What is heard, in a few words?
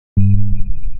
audio hover